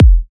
strong bottom end kick drum sound F key, Royality free bass drum single hit